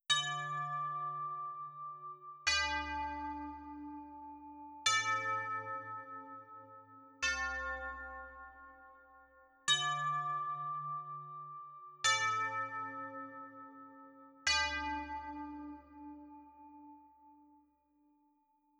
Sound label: bell 100bpm C